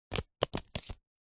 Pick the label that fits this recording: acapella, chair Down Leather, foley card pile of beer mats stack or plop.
foley card pile of beer mats stack